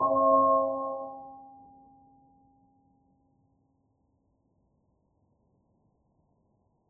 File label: hum prime tierce quint nominal; cccbr; glossop